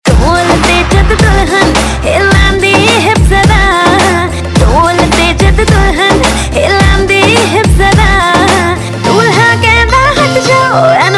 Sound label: Dhol